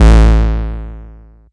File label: Bass Root A